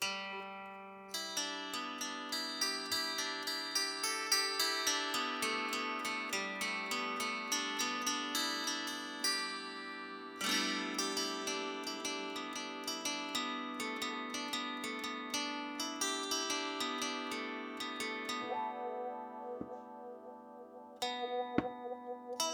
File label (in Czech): kantela dlabana sedmustunna pentatonicka